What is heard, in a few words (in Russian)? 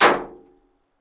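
тупой удар по металлическому тазу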